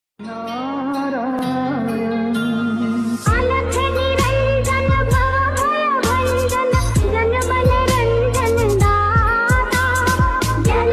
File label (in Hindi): alakh niranjan narayan bhajan